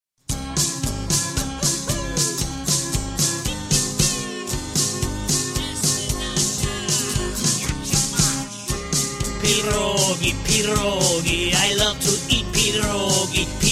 polka

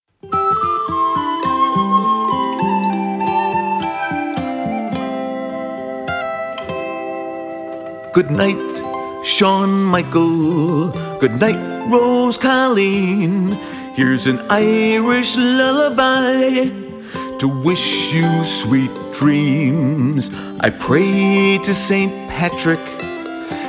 irish lullabye

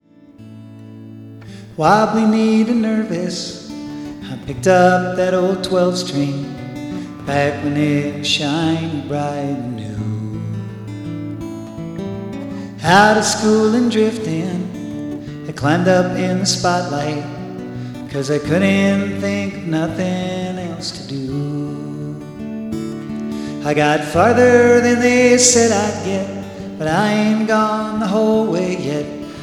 kid with a guitar

